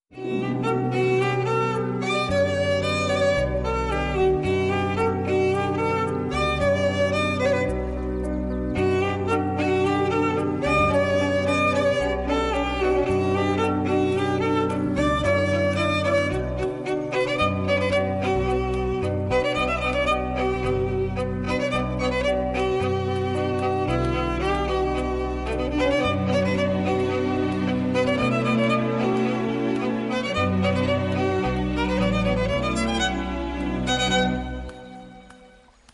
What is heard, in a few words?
Sad BGM Ringtone; Saravanan Meenatchi